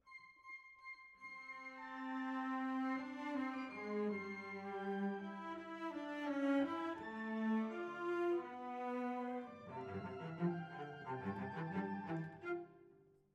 Cellos

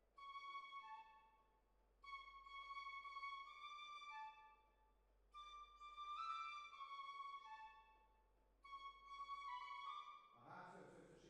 Hall, Jazz for piano

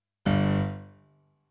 PIANO, RNR for piano

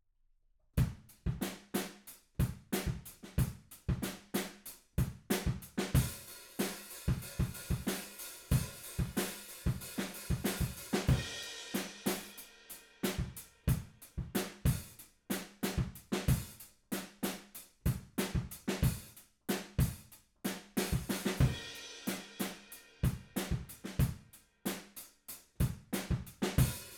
Room

